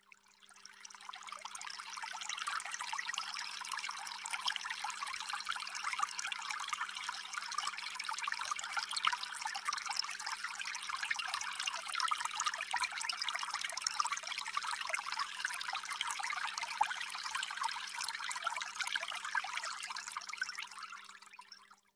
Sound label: splash ambient